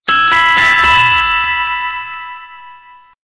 alarm early riser

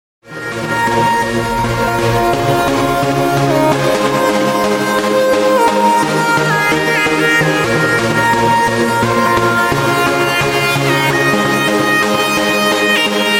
house music mix

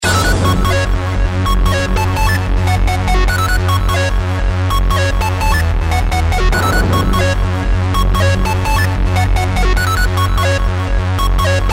big beat

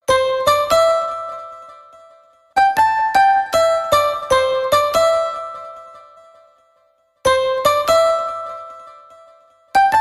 nokia lumia ring

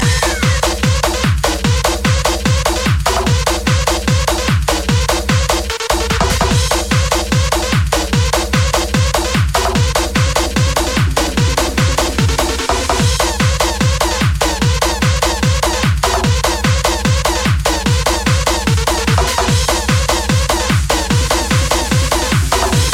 techno mix

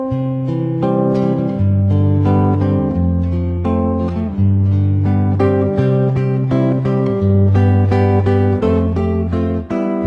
plucking soul

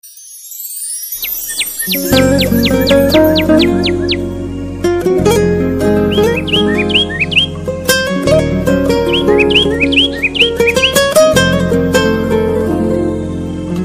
nature and guitar mix

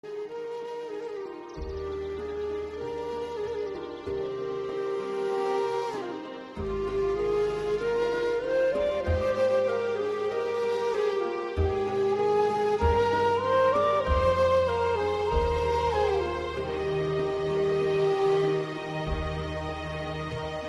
flute

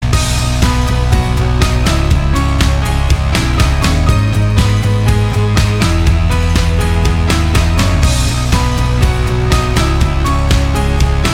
piano rock riff

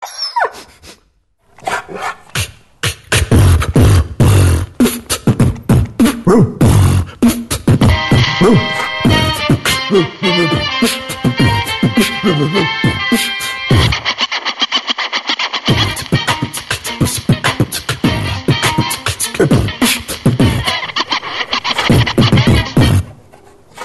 beatbox dog

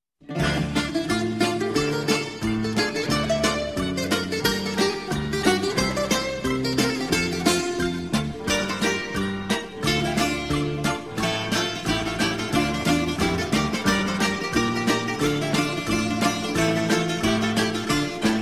greek music

